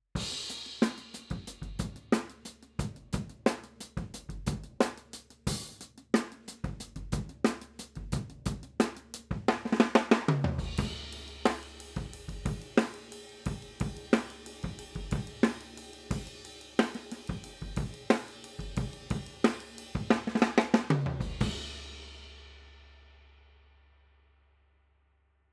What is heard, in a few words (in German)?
Overheads Hyper Niere; sE Electronics T2